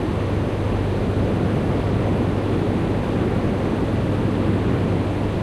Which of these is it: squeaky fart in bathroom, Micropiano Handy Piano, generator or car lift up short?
generator